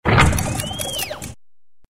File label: Combine Item